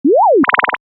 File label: Mail arrive, Mail Arrive: Alert To Indicate New E-Mail; Chimes; Multimedia; Chime Sound Effects